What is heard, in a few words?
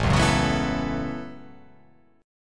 A 6th Add 9th, A6add9 chord; strummed